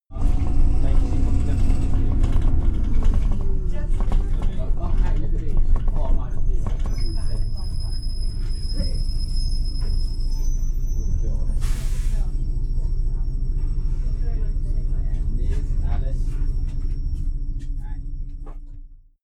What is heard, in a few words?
bus ride